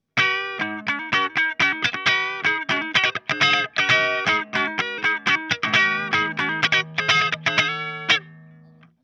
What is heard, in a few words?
cutting, eq